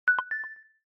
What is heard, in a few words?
popup